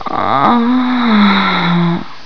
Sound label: GROAN; psion cd vol1